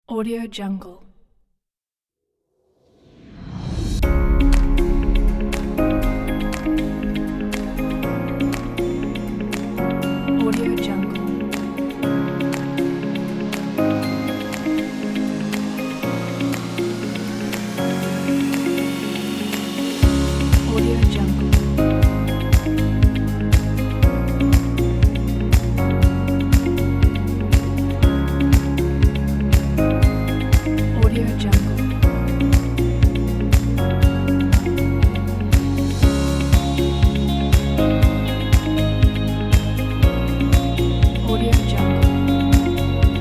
bells corporate fun

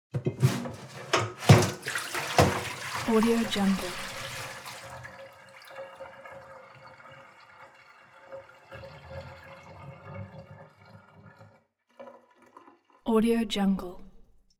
object kitchen sink water pot pour out

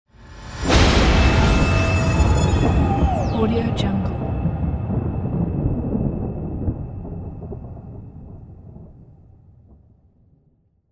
artificial thunder

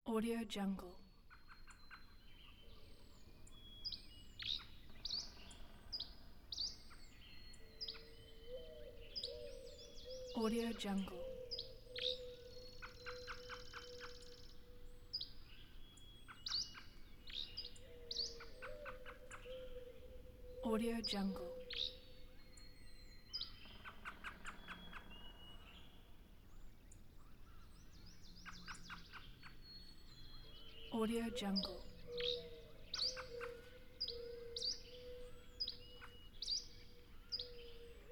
morning nature

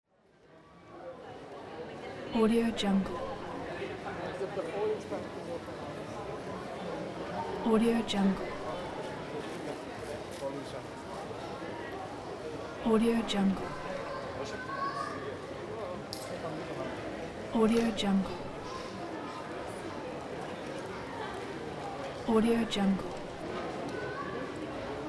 people ambience

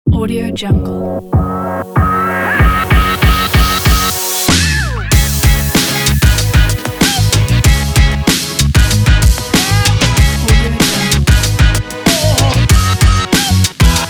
inspiring energy hiphop

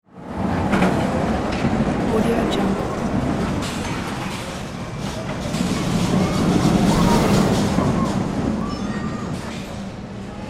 rollercoaster in a park

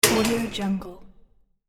gate metal bang; hollywoodedge

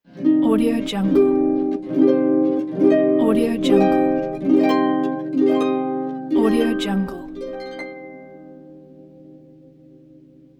harp minor chords

